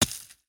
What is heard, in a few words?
dirt hit